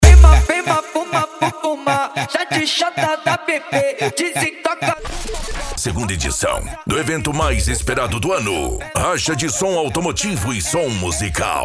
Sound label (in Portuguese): racha de som automotivo e som musical